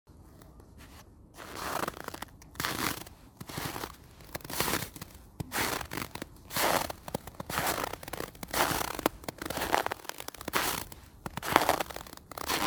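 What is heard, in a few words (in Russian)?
по снегу с морозной корочкой медленно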